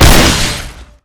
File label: bombshot shoot; tf2